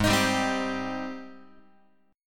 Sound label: G sharp Major 7th G sharp, Guitar; x,4,6,5,4,6; JGuitar